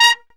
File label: Brass Stab; CMI